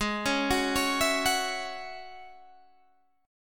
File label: G sharp 7th Suspended 4th Sharp 5th G sharp, Guitar Open D